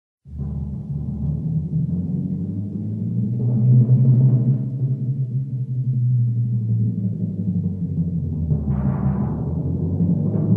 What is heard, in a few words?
Timpani